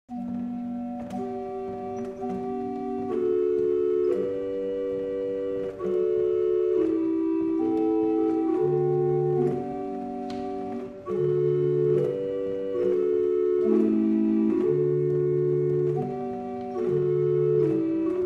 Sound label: barrel organ; Liddington